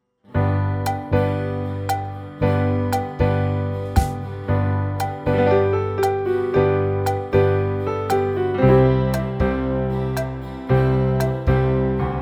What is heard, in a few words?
karaoke